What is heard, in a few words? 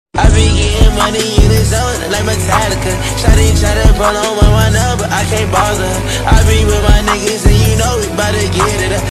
random song sfx meme